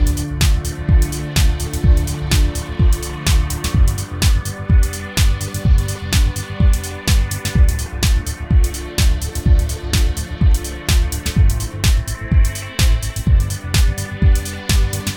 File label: Racing Menu; action